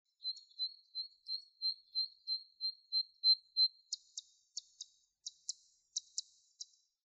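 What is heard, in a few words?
sekka song, zitting cisticola; saezuri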